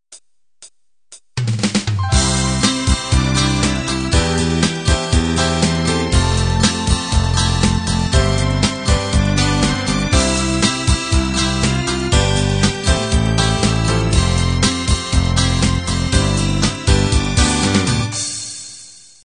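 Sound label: pop 16 beat intro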